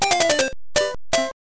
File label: Death; Super Mario Land; The Cutting Room Floor; SML